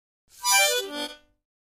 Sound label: Mouth Organ Glissade, harmonica; woodwinds